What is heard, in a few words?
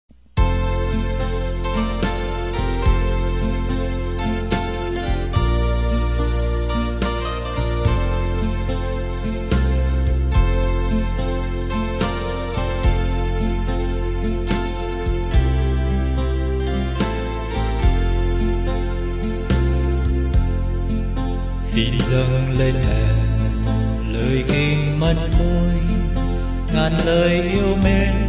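soprano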